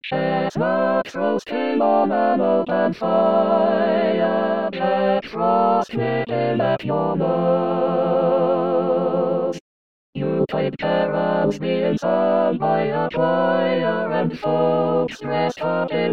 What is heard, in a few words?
SATB